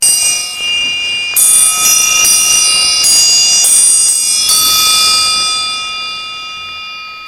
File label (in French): triangle